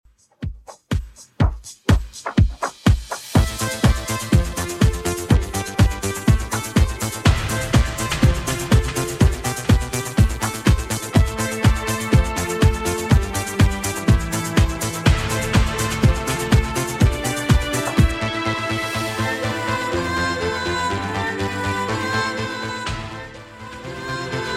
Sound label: 90s Redrum 123bpm CLEAN